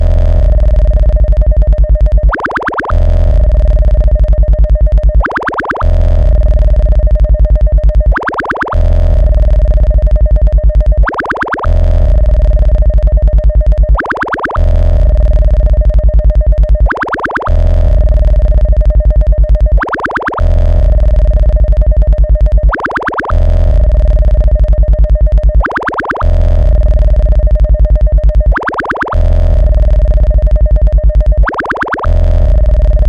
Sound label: techno